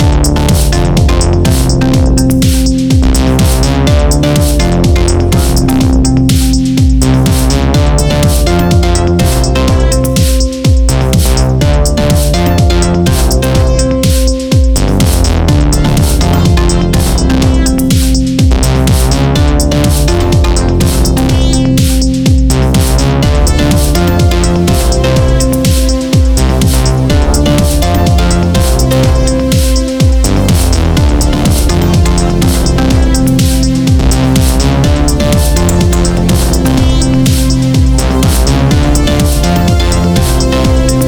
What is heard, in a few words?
Big Club Anthem